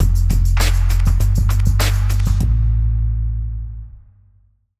100bpm light staccato cello ending underscore short